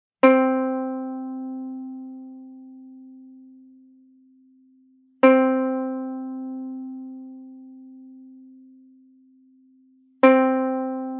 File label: ukulele c string sound example